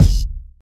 focused kick sound c key, steel kick drum tuned to the C note